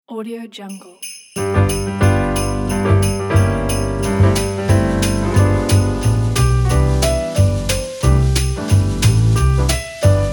jingle bells christmas jazz

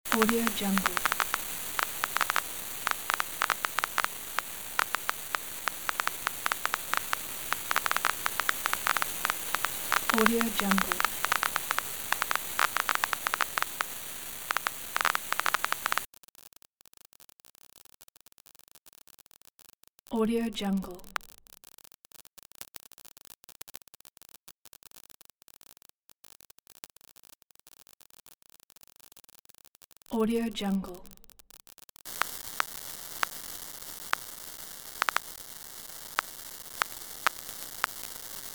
vinyl crackles

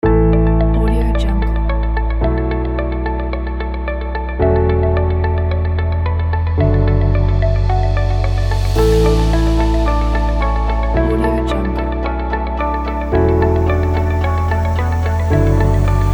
inspiring motivational corporate

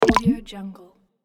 soft bubble pop up

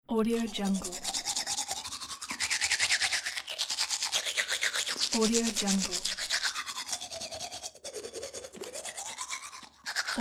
brushing teeth

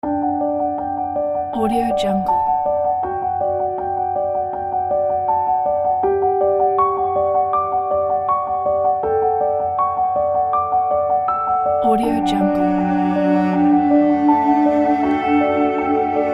inspiring family holiday

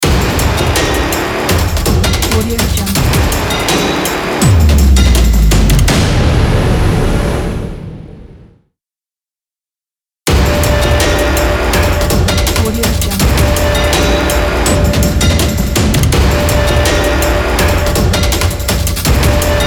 industrial logo

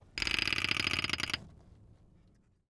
plastic ratchet truck blinder

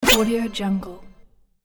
ouch gremlin hit game

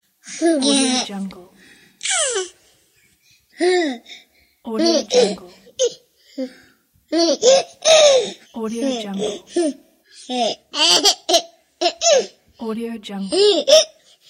happy baby

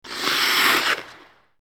ice skating stops

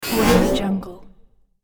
space door open

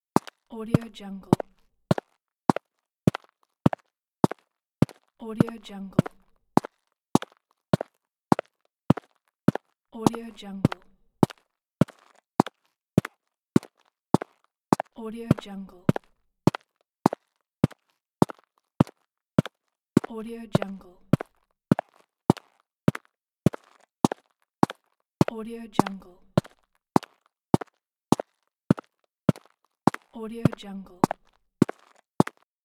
walk slowly with sneakers on a road

